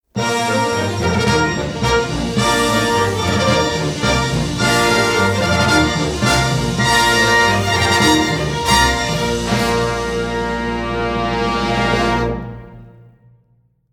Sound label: Wedding Procession